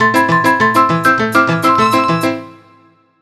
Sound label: Hurry; Haunted3